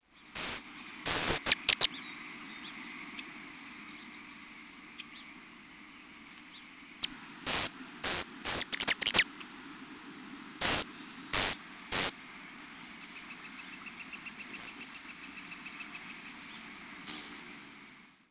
rufous hummingbird

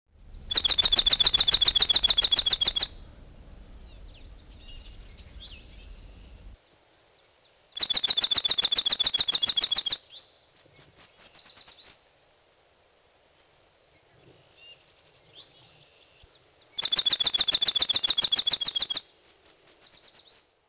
swamp sparrow

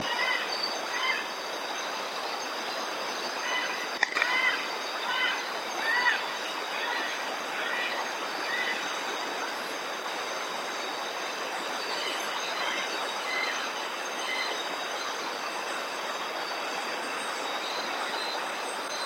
black lored parrot

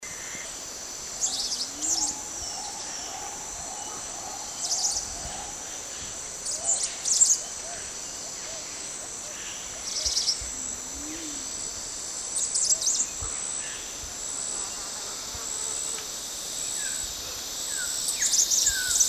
geelvink pygmy parrot

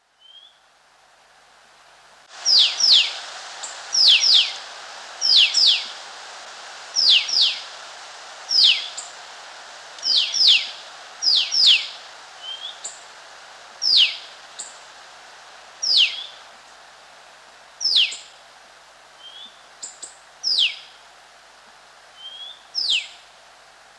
blackish cuckooshrike

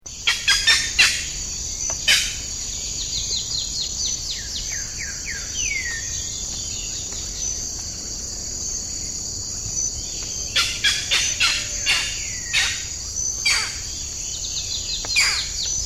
striated heron

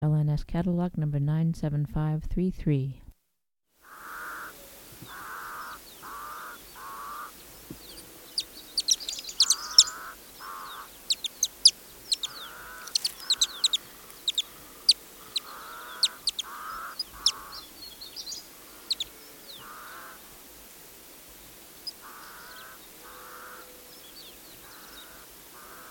madagascar kingfisher

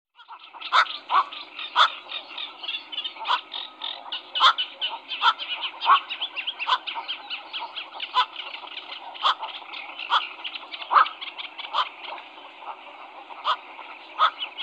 black crowned night heron

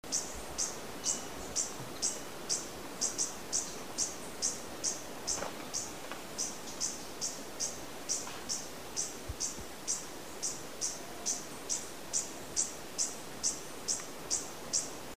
stripe tailed hummingbird

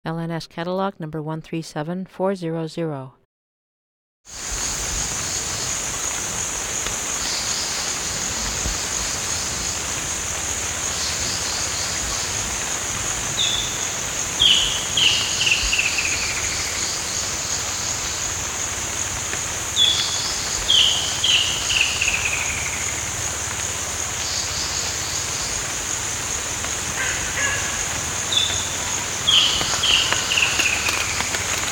mangrove kingfisher

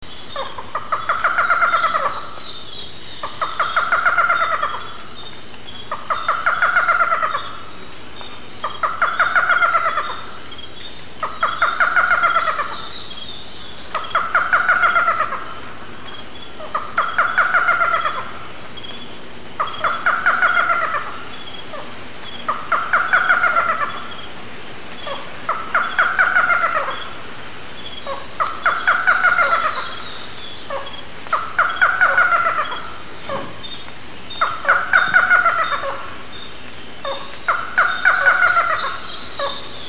cryptic forest falcon

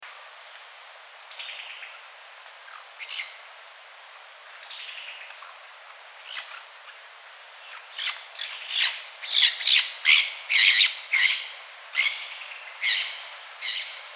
fiery shouldered parakeet

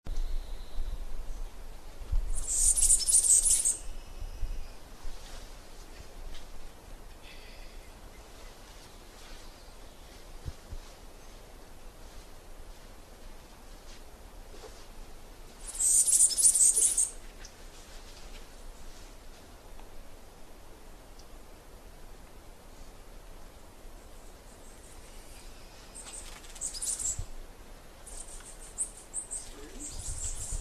slaty finch

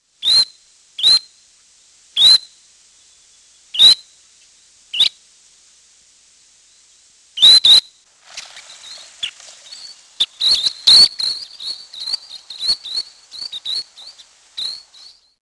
least sandpiper

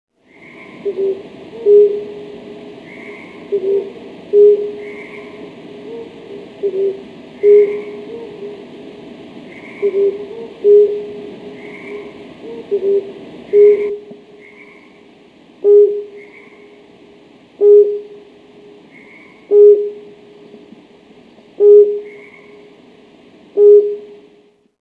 flammulated owl

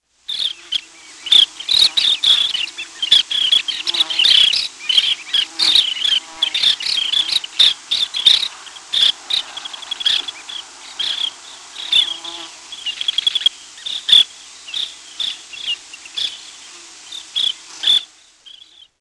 bairds sandpiper

